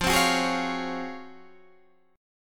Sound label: Db Major 11th F